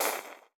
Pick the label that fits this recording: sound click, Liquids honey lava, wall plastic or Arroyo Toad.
Liquids honey lava